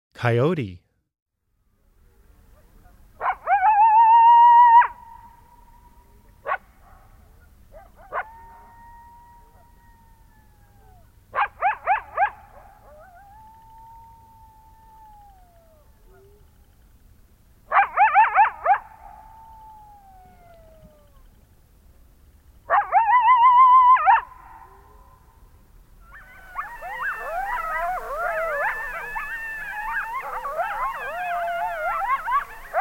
Coyote, Wildlife Sounds

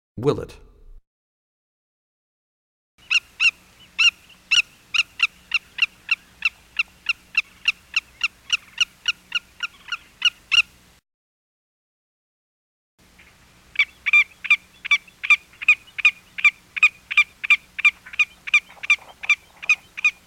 Willet; Animals; Birds; 101BirdSongs

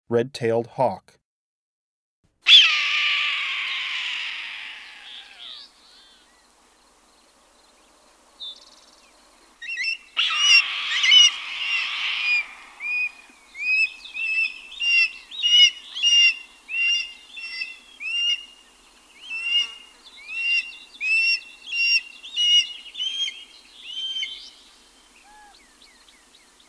Red tailed Hawk

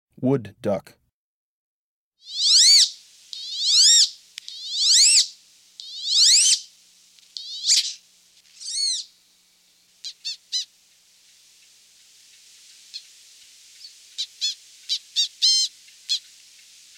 Wood Duck, Birds of the Chicago Region

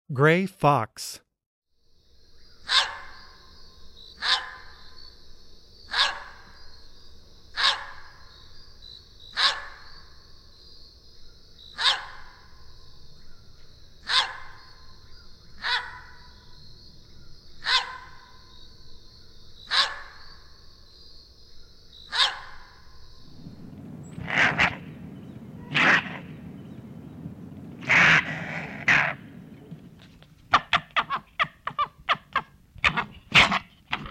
Gray Fox, Wildlife Sounds

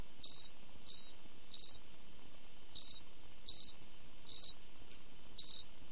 Gryllus pennsylvanicus; Arthropoda

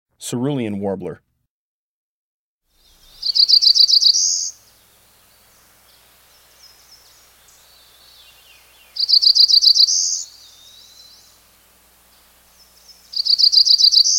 Cerulean Warbler; Breeding Birds of the Chicago Region

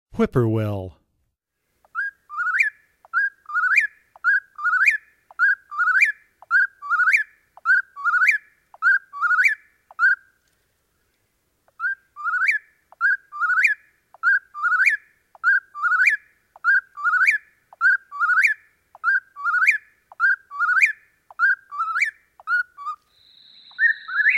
Whip-poor-will, Wildlife Sounds

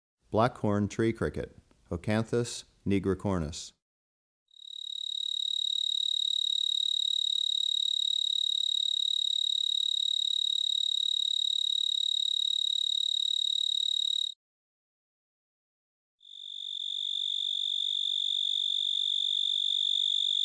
Blackhorned Tree Cricket, Oecanthus nigricornis; Crickets and Katydids

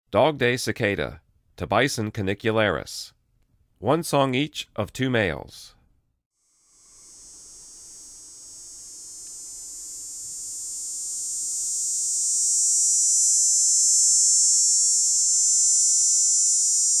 Dog-day Cicada, Insects; The Songs of Insects

